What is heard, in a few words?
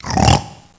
snort Up